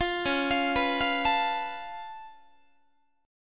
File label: C sharp Augmented 7th C sharp down Guitar, chord; 20,21,20,21,21,20; arpeggiated; JGuitar